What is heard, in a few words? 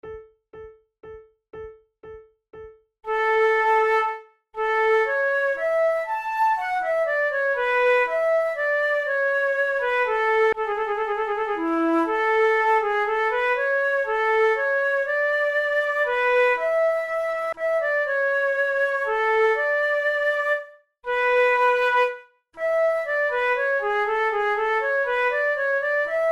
prelude, flute